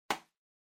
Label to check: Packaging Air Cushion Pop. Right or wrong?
Right